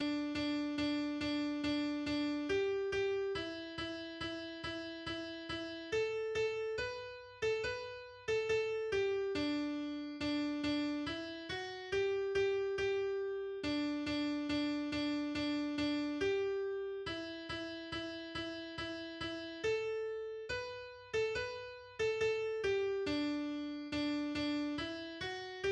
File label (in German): Lied Klavier